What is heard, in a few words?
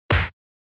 SNES Fighting Hit